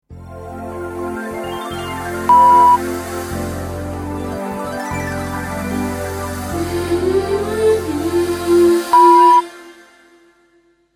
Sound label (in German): Sound Logo mit Sound Bett 5 Toene Dream